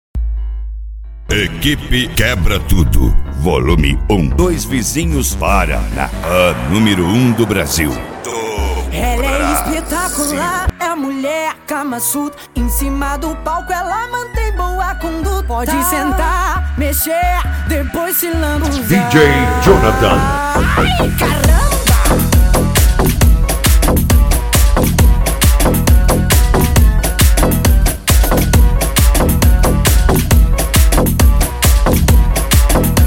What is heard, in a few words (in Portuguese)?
mega funk